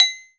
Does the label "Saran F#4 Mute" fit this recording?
Yes